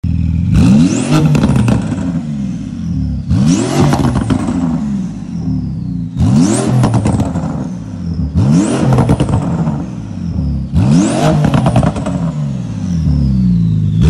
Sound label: Porsche GT2RS Titanium Exhaust, car engine